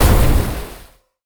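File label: magic explosion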